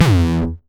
909 Grime Layer E1, Kicks; Mbase 11 Kicks

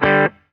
GTR, Roots Disco; USB Soundscan vol.32; AKAI

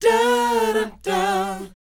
BOYZ E, Vocal Planet R&B & Dance; ILIO

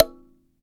Dyna Sansa; Roland LCDP11 Africa VOL-1; Sansas

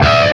HARMONC DRAG, Guitar

